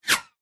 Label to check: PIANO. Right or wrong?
Wrong